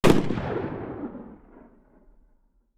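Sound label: shotgun exterior shot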